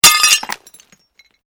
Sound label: GLASS SHARD SMASH